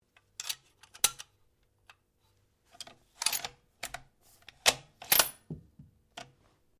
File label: RIFLE REMMINGTON700 7.62MM LOADING SEQUENCE; A selection of domesttic firearms; Rifles; Guns